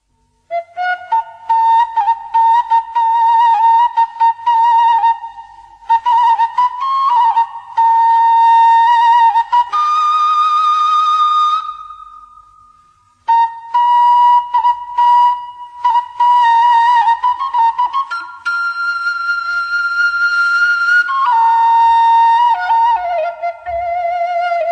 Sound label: Traditional Music; meloboom